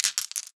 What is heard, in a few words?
Marbles Rattle